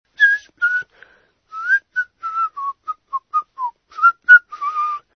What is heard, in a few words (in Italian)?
fieschiettare uomo